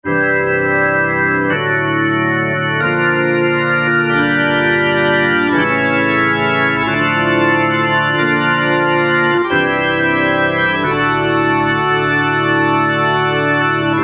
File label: Hammond M3